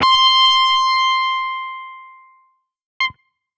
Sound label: guitar electronic, distortion guitar